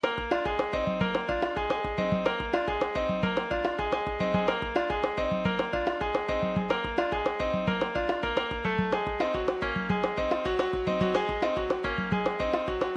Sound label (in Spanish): Ritmo Del Sol